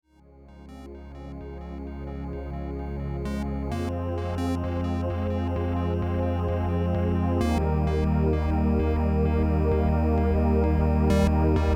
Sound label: Sine Wave; waves soundtrack 2012